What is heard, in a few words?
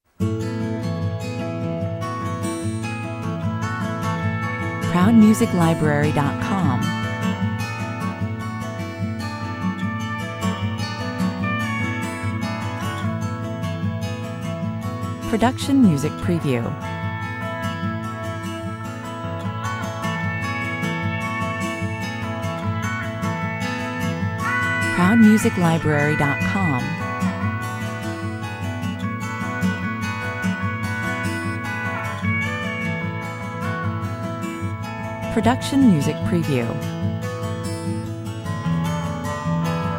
Gentle americana, pretty and touching. Performed on pedal steel guitar and acoustic guitars.; Thoughtful, reflective, romantic